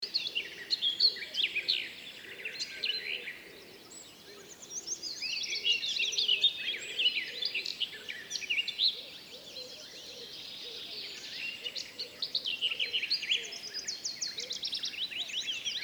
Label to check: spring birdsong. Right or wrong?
Right